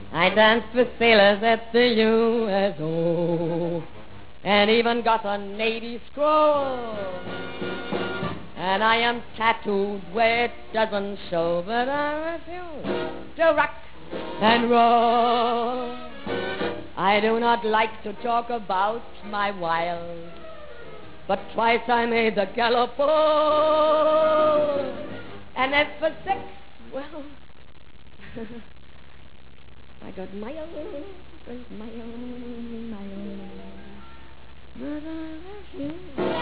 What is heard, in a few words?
rock in roll